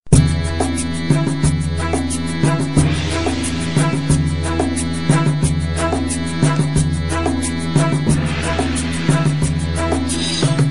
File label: terror music